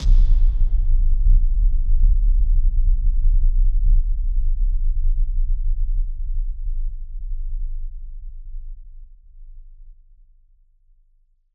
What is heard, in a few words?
Hit Splosion; musicradar; bomb factory